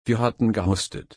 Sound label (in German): husten